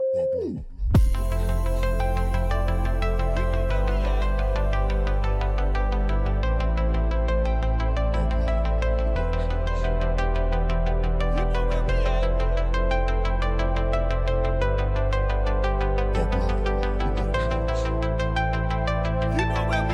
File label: POP EDM